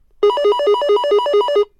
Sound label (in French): Telephone GIGASET DA710 Sonnerie